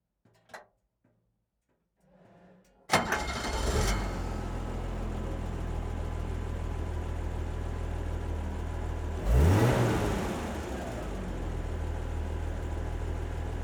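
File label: Pvrbbv 551 ONBOARD IDLE Start Blips Off ENGINE Mix, Tank Destroyer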